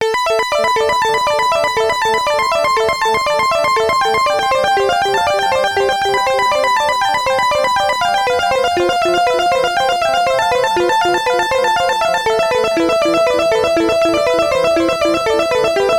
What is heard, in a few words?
riff overdriven smooth sin variation; Riff Teaser